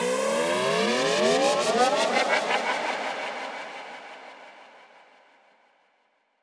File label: Sweep FX; Effects; Electronisounds